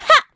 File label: Vox Attack; Estelle; kings raid; en